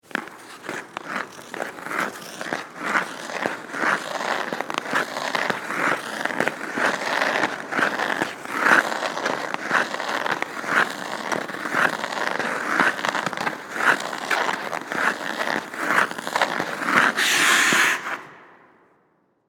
Ice Skates Follow, With, Mic, Circle, Indoor, Ice, Rink; Ice Skating Sound Effects